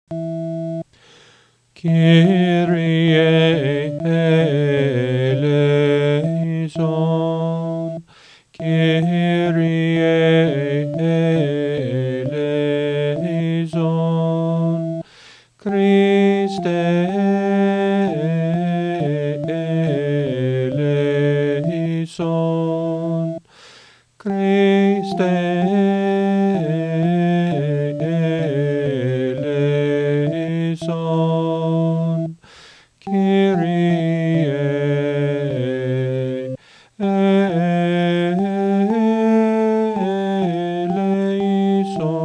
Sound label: Mass XV Kyrie Latin, chant; Mass Parts